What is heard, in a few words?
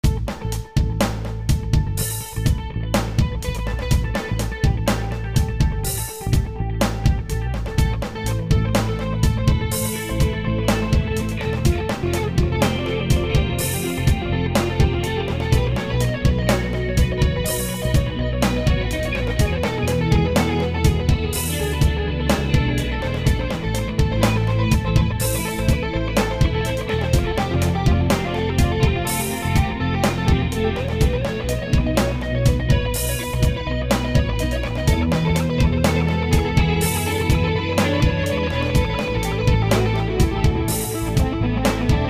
Tone loop, Guitar